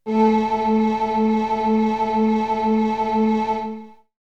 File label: Synth; ZERO G - Datafile DISC 1